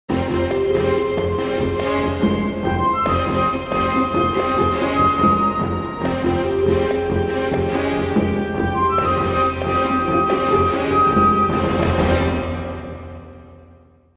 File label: Music bed - Jungle action, with congas and strings; Hollywood Edge Sound Designers Tool Kit